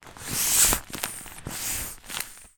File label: toy bop bag defalting squeezing air out almost flat compressions x2